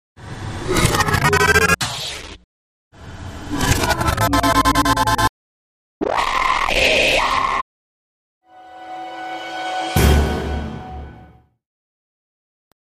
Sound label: Pressure Lock Pressure drop Crescendo Tail